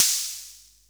Cymbal, Korg MP7

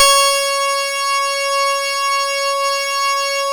C#, PIANO; Big Fish Audio; Synclav FM

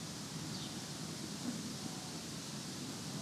Ambi Loop, outdoor samples Ambience Loops

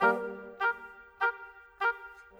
Bassoon Oboe; Sonic Foundry (Sony Creative Software); Orchestral VOL-2; Modern

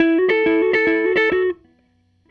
Gretsch Guitar Loop; Sonic Foundry (Sony Creative Software) - Six-String Orchestra

